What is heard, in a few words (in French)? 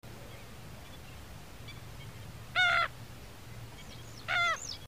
goeland railleur